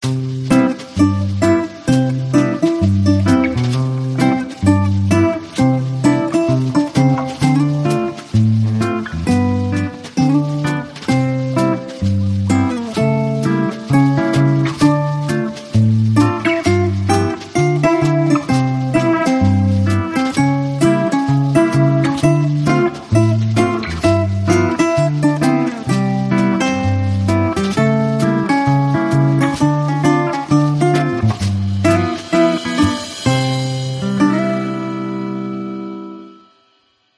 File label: Gentle Jazz